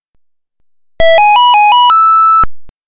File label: brick game start